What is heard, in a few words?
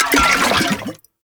Alchemy Grenade Aim; Entrati